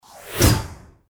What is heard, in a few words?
whoosh little hit